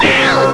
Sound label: Die